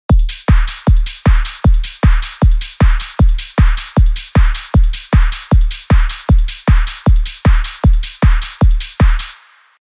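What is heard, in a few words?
4つ打ち bass drum trance; EDM; Uplifting Trance; ドンタードンタードンタードンターです。; ハイハット・クローズは16分で刻む; ハイハット・オープンは裏打ち; 2・4でクラップを鳴らす; 4つ打ちドラム（トランス）の例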